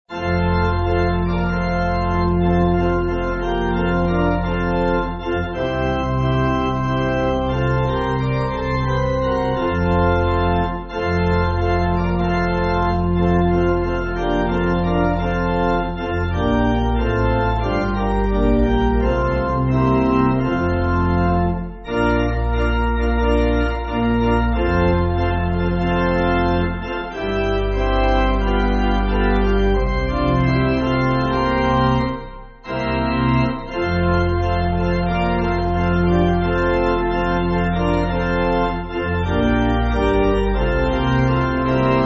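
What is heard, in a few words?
Pipe Organ, Backing Files for Churches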